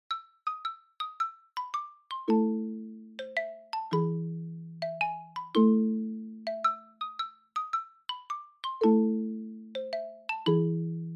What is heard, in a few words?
For Elise Shuffle